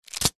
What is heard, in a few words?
foley unopened greetings card in cellophane wrapper set down; zapsplat